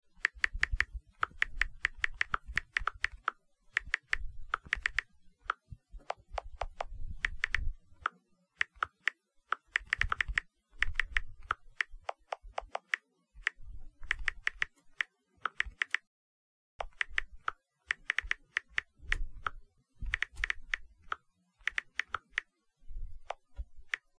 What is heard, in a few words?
Typing on Phone